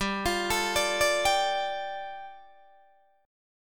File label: G 7th Suspended 2nd G, Guitar Open D